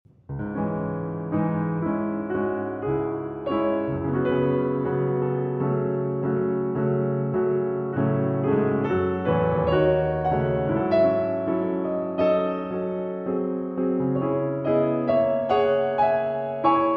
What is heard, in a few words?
Etude in C-Sharp Minor; Pieces, Op. 2 No. 1